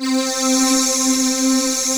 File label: COOL VOX C3; AKAI S-Series CD-ROM Sound Library VOL-8